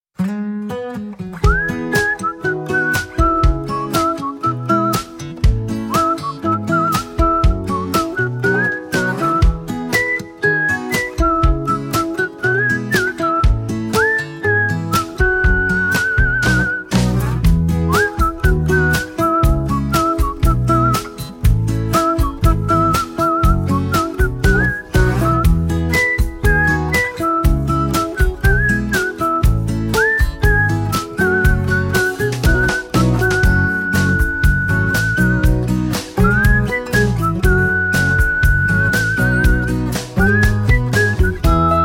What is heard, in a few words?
whistling music; dolphin tunes